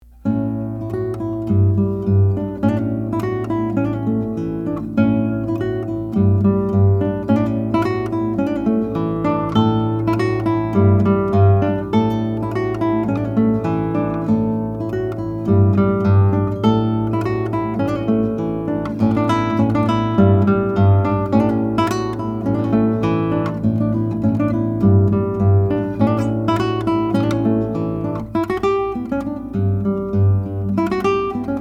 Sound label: Corn; Sakurai; Corn Yards (Scottish / Renaissance, 1596-1657); Adl600